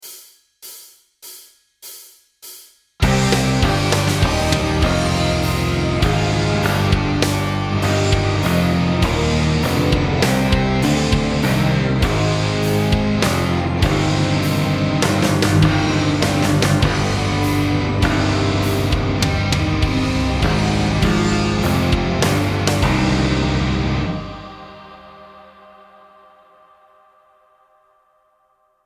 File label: metal guitar counterpoint